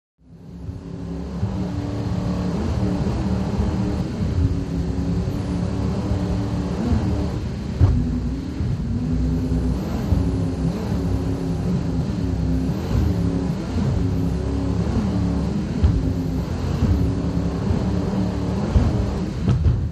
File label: Power Boat Int Racin, off shore power boat